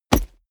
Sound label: combat cloth punch; krieg-waffen; nahkampf; soundpool